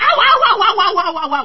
owowow; Luigi

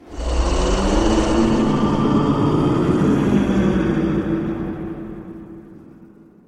messenger notification sound; bloodborne

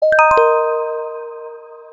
donation sound; donateanycrypto

